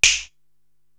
M1 Fingersnap

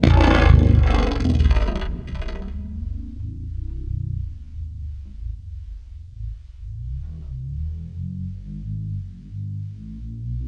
REV, Guitar; East West; Steve Stevens Guitar Samples Collection

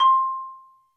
XYLOPHONE C6; Greytsounds VOL-1

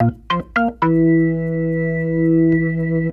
Organ Lick; Ambient Grooves; Organs Licks

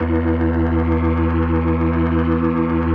E2, B3

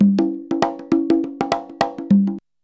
CONGA, Modern Percussion Loops

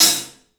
HAT, CLUBHAT, 3D Drums; 3D Sound

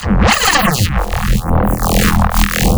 reese; bass

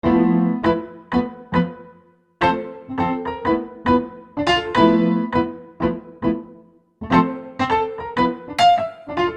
Keyboard, Soul; Sonic Foundry (Sony Creative Software); Infinite Soul VOL-1; Keys

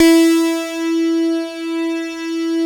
PERC BA; Synclavier Sampler Library; KEYS AND GUITARS

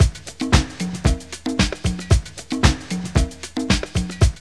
DISCO LOOP; Zero-G; Funky Elements

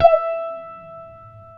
WOW GT1-E4, WOW GUITAR; AKAI S-Series CD-ROM Sound Library VOL-7

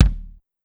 Kick, Pitched Up; Clavia DDrum-AT

